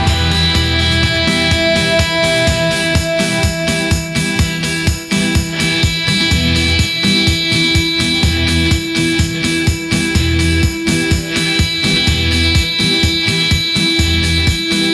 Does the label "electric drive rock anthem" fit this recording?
Yes